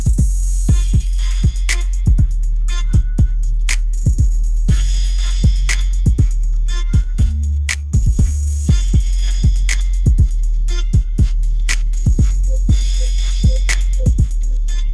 sunshine pop groove, ringtones

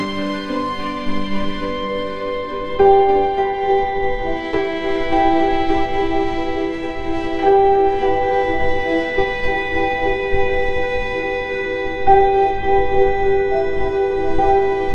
elegant classical ringtone melody

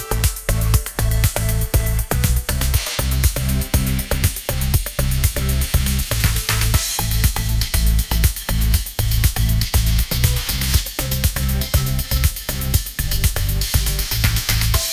sunrise energy pop groove, ringtones; ai

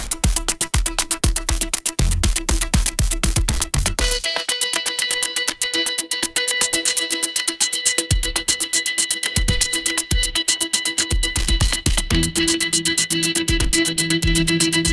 guinea pig pop notification, ringtones

